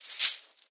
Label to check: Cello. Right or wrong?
Wrong